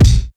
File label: treble rich pop kick single hit a sharp key